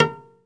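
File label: SOLO CELLO, Synclavier Strings; ILIO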